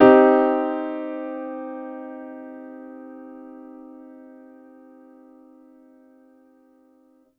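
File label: Piano Grand minor med; TrashLibrary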